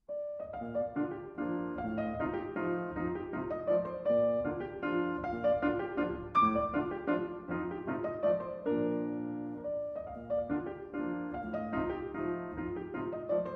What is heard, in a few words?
German Dances, in B-Flat Major